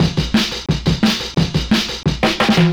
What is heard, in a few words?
175, Breaks; EZRollers